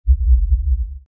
Lfe Swell Low Bass Movement; Swells; Blastwave; Sound Effects:Sound Design

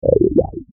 Swamp Bog Frog, monster sound; fantasy

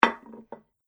Medium Sized Dinner Plate Setting Table; Dishware Sound Effects; Household

